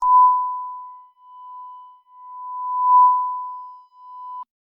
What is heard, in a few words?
modulated censor beep long